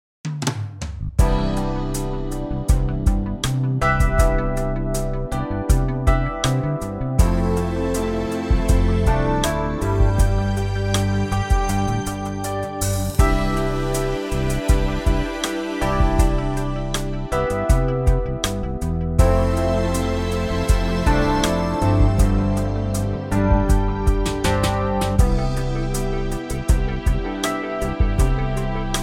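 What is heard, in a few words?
C, Backing Tracks for Male Vocals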